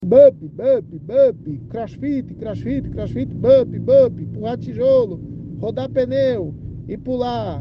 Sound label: burp burp burp; crossfit crossfit crossfit